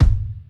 kick, house

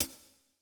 Rock hihat; Reference Beat

